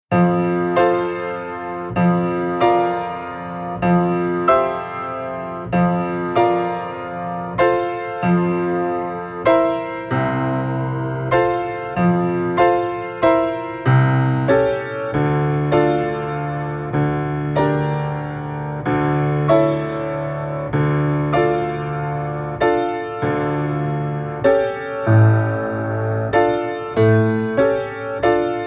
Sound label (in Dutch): Sprongen met twee handen, Piano oefeningen; Sprongen met twee handen in een p